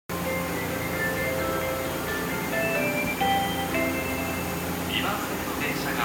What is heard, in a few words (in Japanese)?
六甲ライナー発車音, train sounds; 神戸新交通